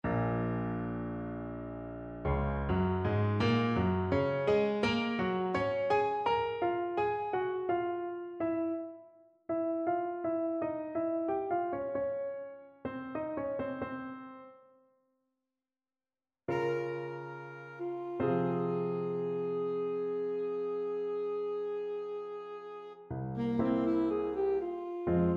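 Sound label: ballade alto saxophone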